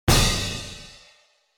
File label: beep (drum); stadium; music